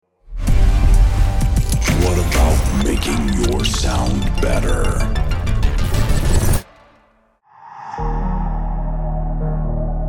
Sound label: Slap Bass House Euphoria; W. A. Production